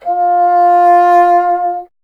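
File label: wind bassoon F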